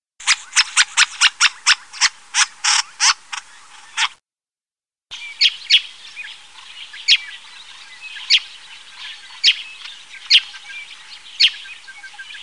woodswallow masked, bird calls

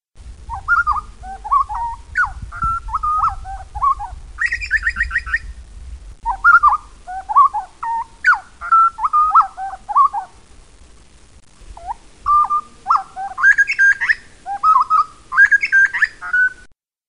butcherbird grey, bird calls

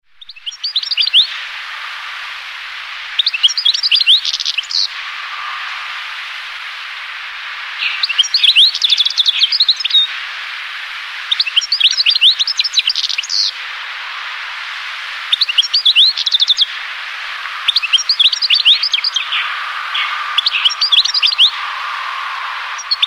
goldfinch european, bird calls

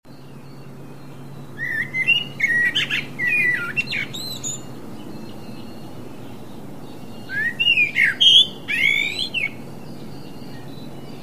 blackbird, bird calls

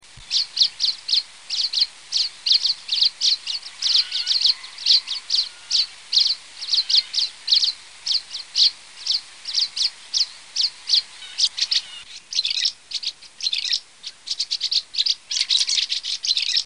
martin tree, bird calls

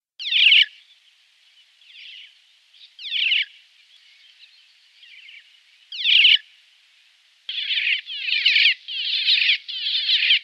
cuckoo-shrike black-faced, bird calls

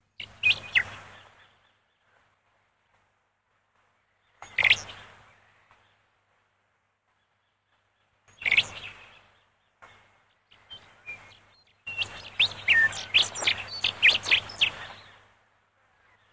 song-lark rufous, bird calls

